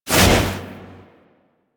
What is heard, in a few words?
Cloak Pulse End; Warframe; Focus; Operators; Powers